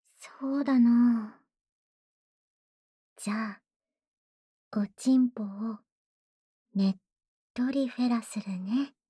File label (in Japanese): ダウナー ねっとりフェラ